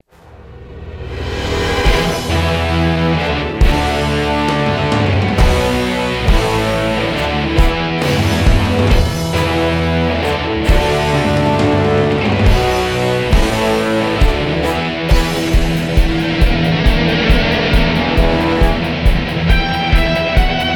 Reveal Loop

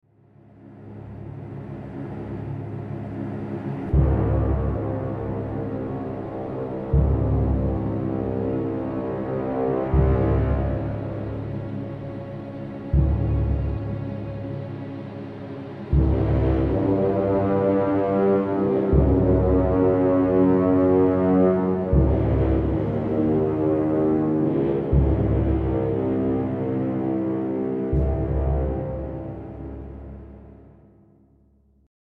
Battlemusic Slow; Age of Chivalry; Windows

